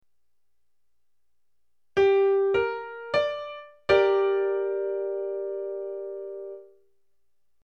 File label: triad